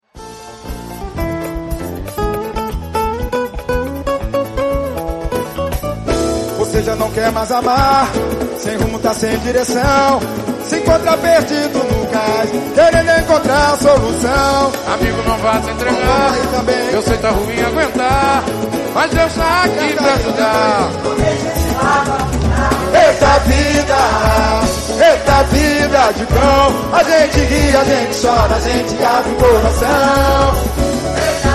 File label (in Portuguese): Samba Pagode